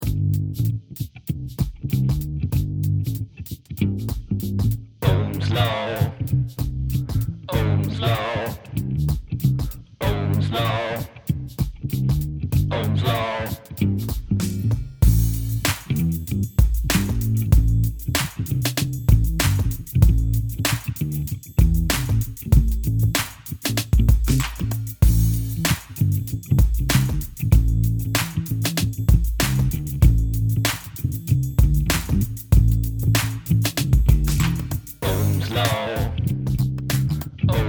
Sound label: Backing Track; Ohms Law